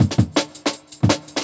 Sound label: 167, Jungle Breaks; 90s Sample CDs; Original Jungle Breaks